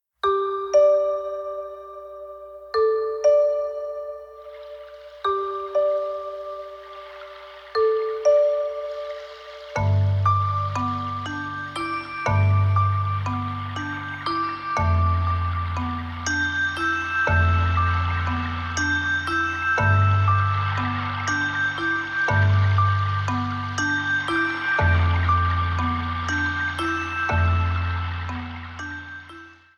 Cachoeira; Casca DAnta; Janela do Céu

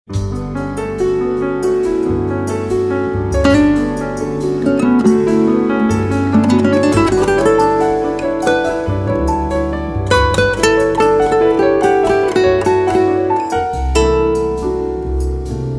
cordas

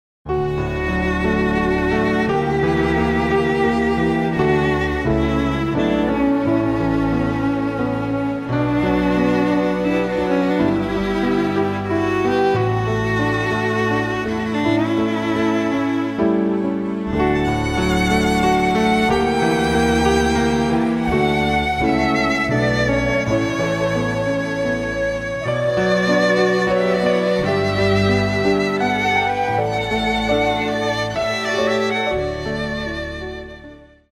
romance re maior

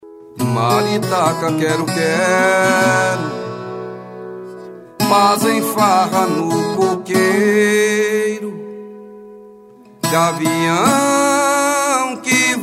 viola

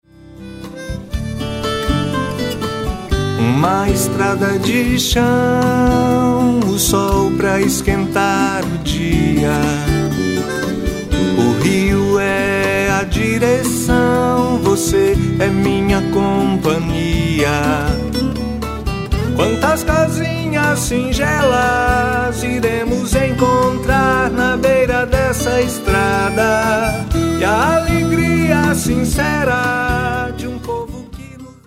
viola

